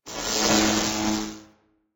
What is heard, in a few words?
Ambient